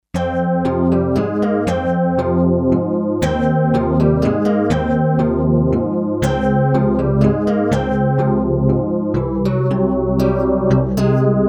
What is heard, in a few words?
Metaloid, Kawai K5000S factory presets